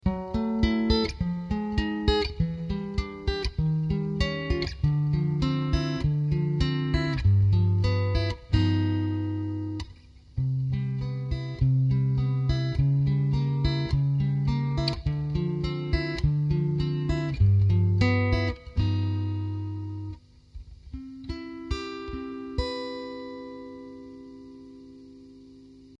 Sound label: acoustic